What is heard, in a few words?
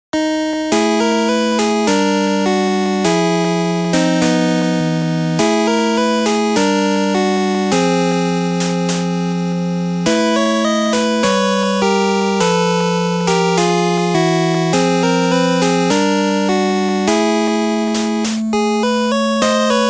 Chapel - Pipe Organ (Out of Tune); The Sword of Hope